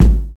dry kick one shot A key, bass drum one shot tuned to the A note